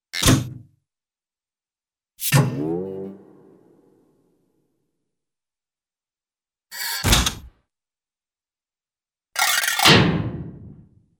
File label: Metallic Impact Creak & Impact Scrape Rattle Thunk, cartoon; Hollywood Edge; Cartoon Trax